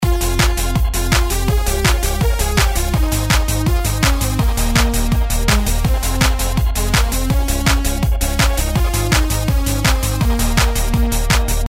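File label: party girl outro background music; Party Girl Ways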